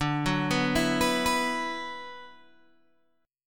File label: D 6th Add 9th D, Guitar, Open G